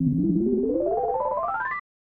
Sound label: Fly Up; Bubsy II; SNES